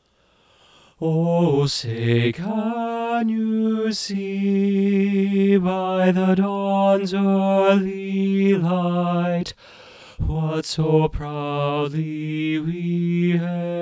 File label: ovation ssb bass 1 middle, choir